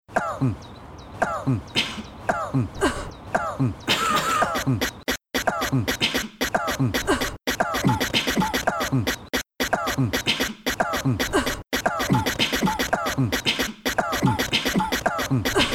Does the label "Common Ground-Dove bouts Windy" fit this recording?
No